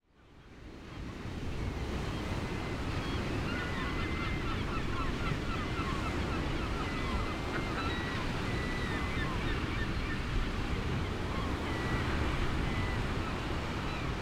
Gull Island Ocean Waves

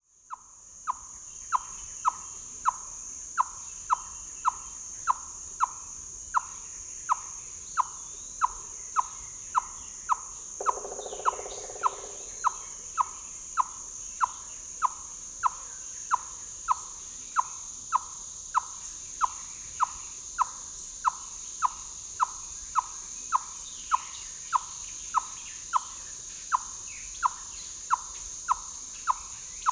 single chipmunk clucking; lang elliott

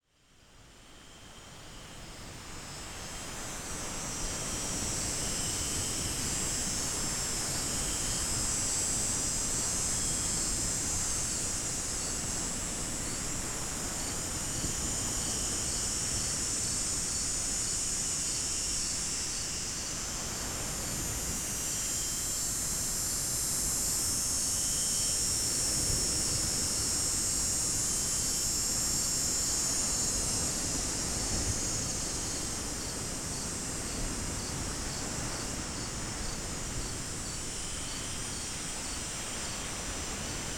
Cicadas Wind Waves Wind Songs

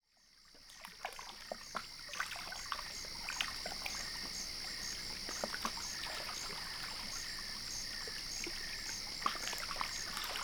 Cricket Wavescape Inland Waters

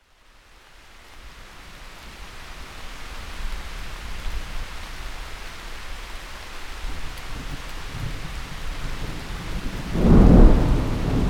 Wind Rain Thunder; Thunder Gods

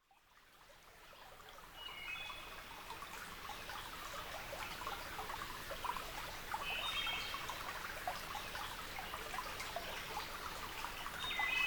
Thrush Glen Night Into Day

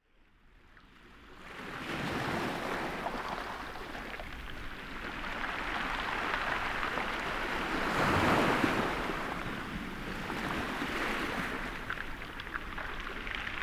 Pebble Beach Ocean Waves

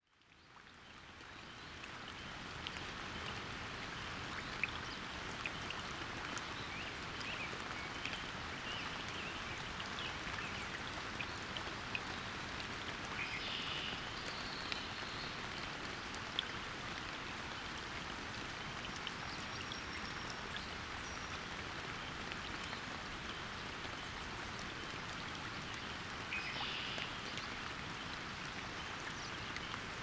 Rain Pool Rain Moods

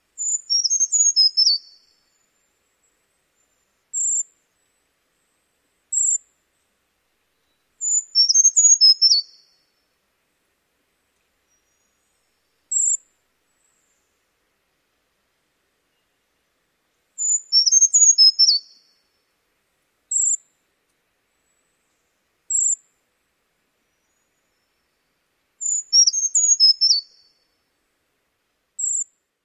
brown creeper

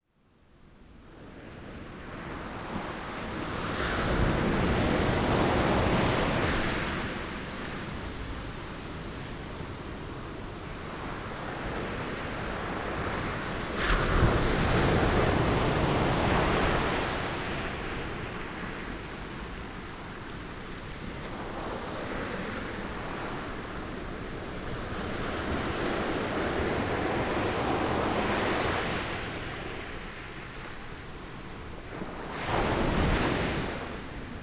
Secret Cove Ocean Waves

